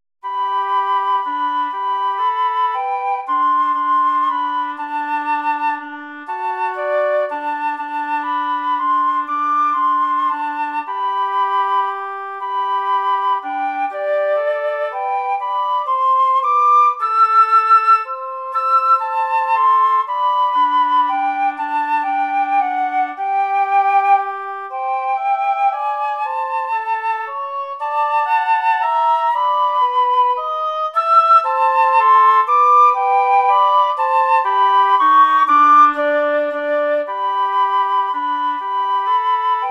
danza cortesana para flauta oboe

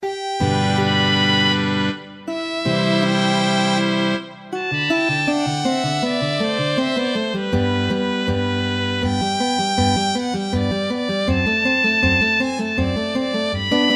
duos violin y guitarra largo; Carulli, Ferdinando; tres duos violin y guitarra

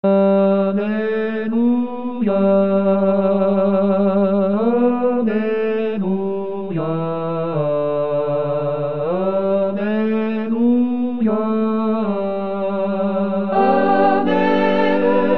misa canon para voces mixtas

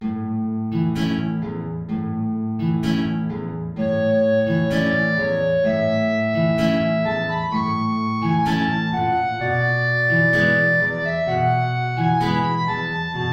tango

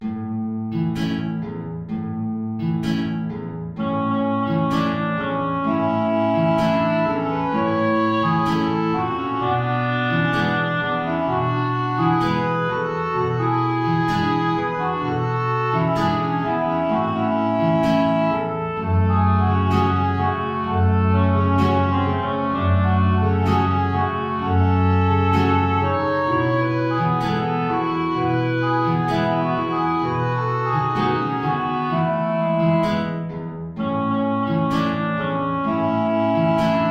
tango

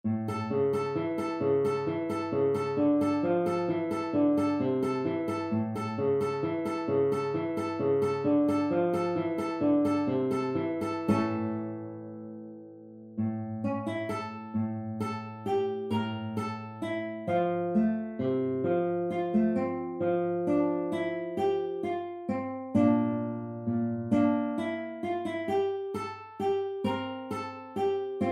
malaguena; lewis alastair

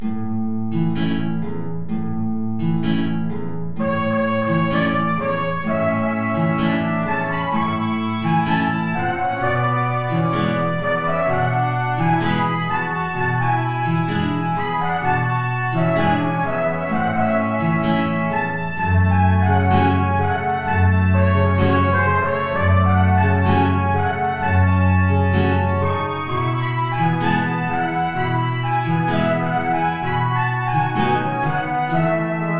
tango

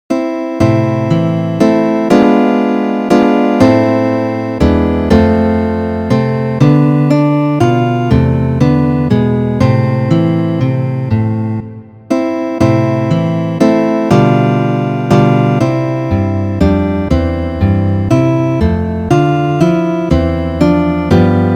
guitarra duos; Ferdinando Carulli

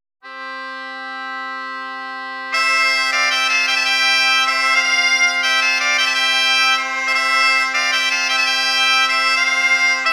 vals; cordeiro

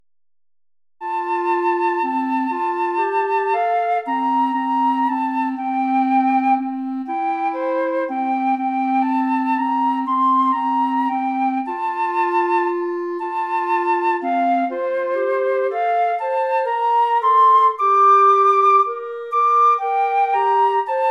danza cortesana para flauta clarinete

